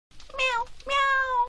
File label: meow; tenchi muyo; ryo ohki